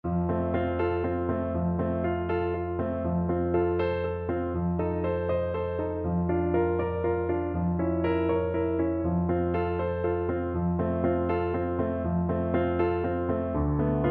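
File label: viola karaoke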